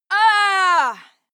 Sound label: female moan hit pain; soundpool